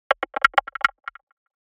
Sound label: typing